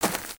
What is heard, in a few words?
Footstep; Lego; Strombie; creatures organic